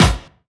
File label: KIT, Dance Mega Drums, HIP; Best Service